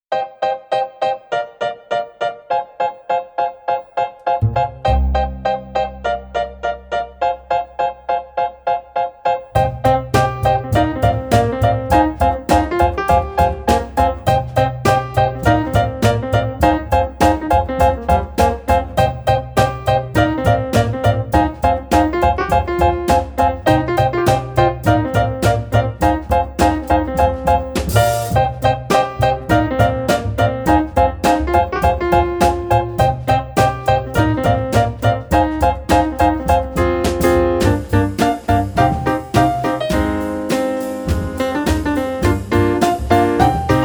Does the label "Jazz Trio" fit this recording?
Yes